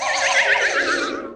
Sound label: vibraharp and slide whistle down